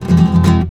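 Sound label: RASGAND F# minor, FLAMENCO Guitars; Spectrasonics; Hans Zimmer Guitars VOL-2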